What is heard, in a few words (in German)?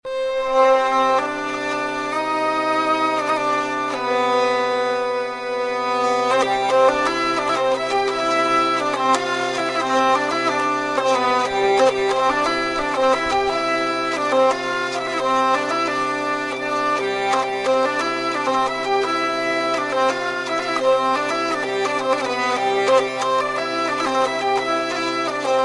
bordun